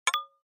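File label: Flash Beep Double Hollow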